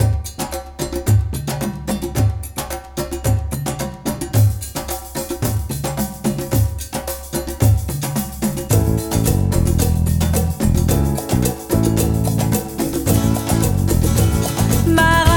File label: Maracas S'il te plaît Maracas Please; Body Parts in French